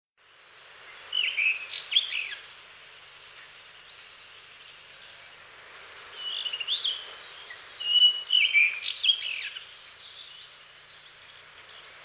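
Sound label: Blue Rock Thrush; isohiyodori; hyogo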